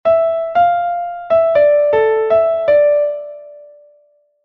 quartole; riemann 1882

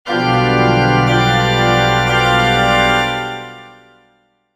quintsextakkord; gathy 1840

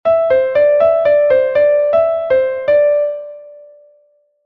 doppelduole; riemann 1882

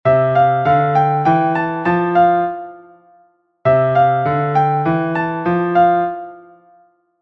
Vorausnahme, Anticipation; Wenn in der Melodie auf eine melodische Hauptnote; koch 1807

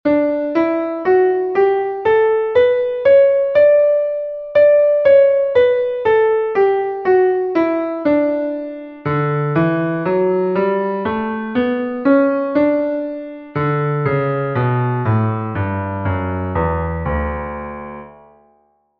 D-Dur, Die Tonleiter bzw. Tonart D-Dur; Die Tonleiter bzw. Tonart D-Dur, notiert im Violin- sowie Bassschlüssel

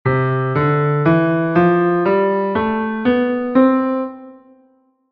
Ionisch

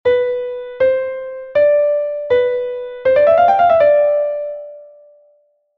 septole; dommer 1865

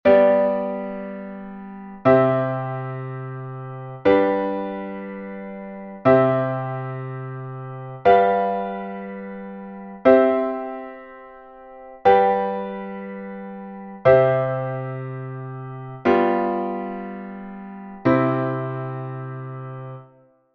ganzschluss; Harmonielehre; dommer 1865

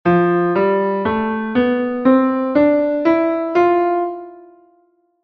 Lydisch

